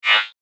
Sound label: Sheer Laser Swipe, whoosh